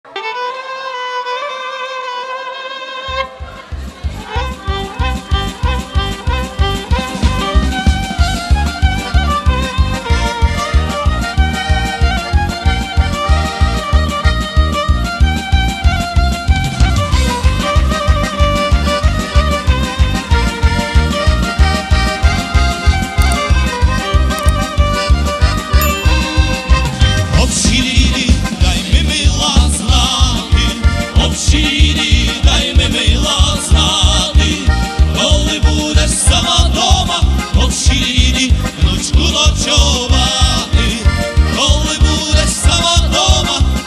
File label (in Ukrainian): закарпатський кручений